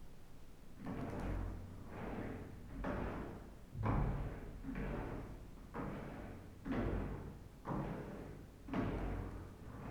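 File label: souji, cleaning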